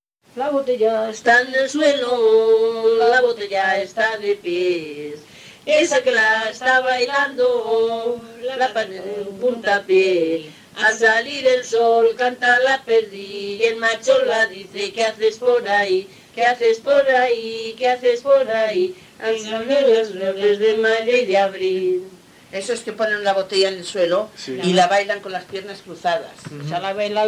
Baile de la botella Medina del Campo

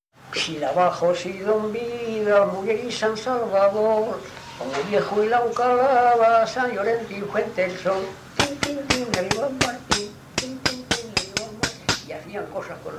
Paloteo de la Seca

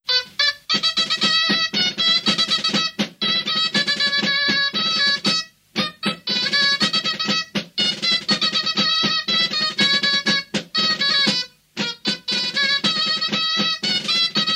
Dulzaina; La danza de la espadaña. Dulzaina Victorino Amo

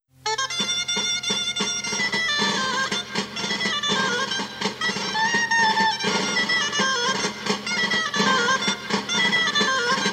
Jota revolvera; Jota revolvera de los Yuguero

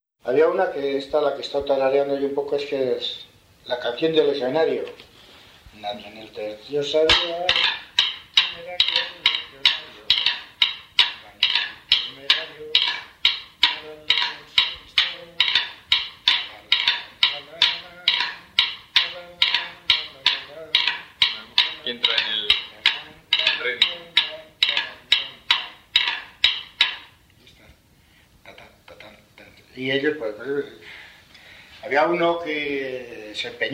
Ritmo de danza de Villafrades

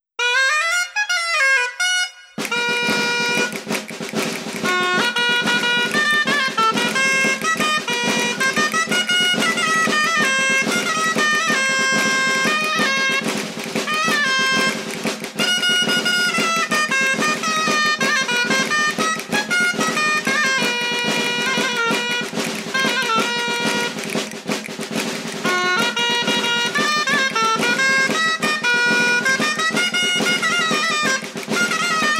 Danza La peregrina de Pedraza de Campos Palencia

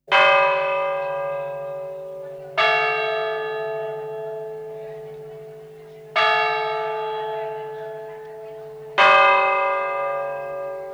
campanas, a difuntos

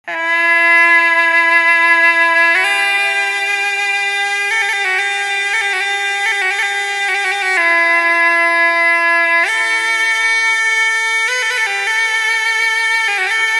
gaita serr

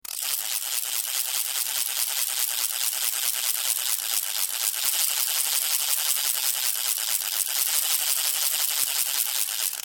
carraca

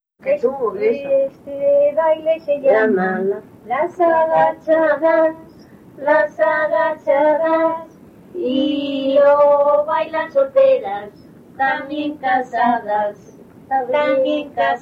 Las agachadillas Medina del Campo

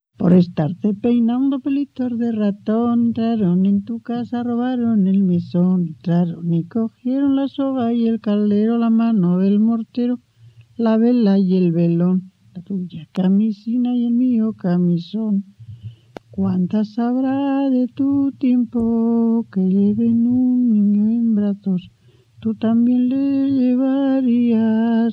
Tonada; "Pelitos de ratón"; La Overuela; Bailes Valladolid

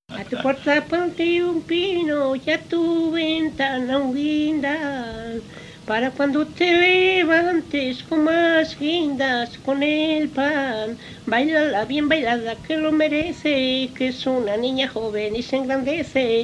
Jota de Gallegos de Hornija

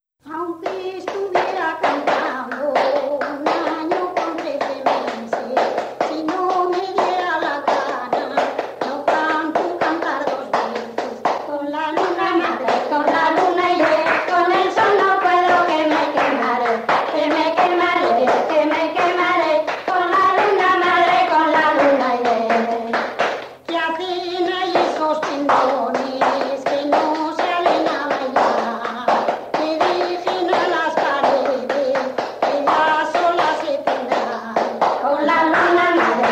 Jota de vendimias, Bailes Valladolid; Valdestillas

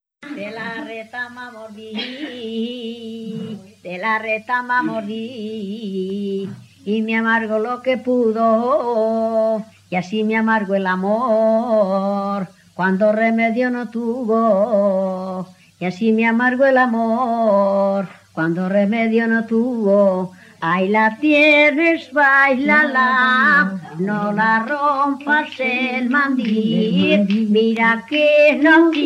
Jotas; Mojados; Bailes Valladolid

